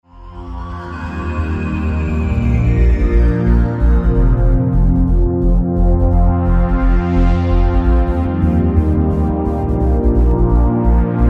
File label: dance music